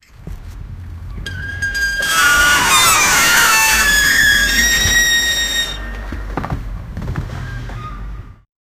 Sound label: ball rolling